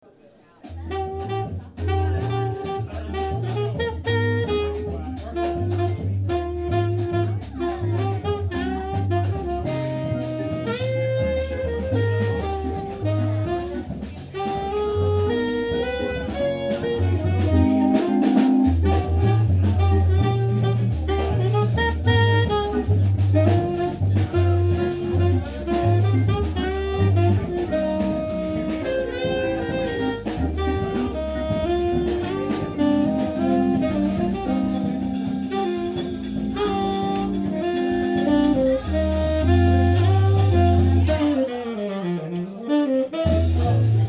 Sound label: Latin Jazz Trio Salsa; jazz band